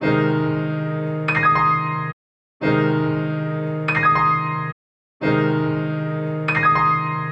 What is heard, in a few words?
piano chord, ringtones; dustinwells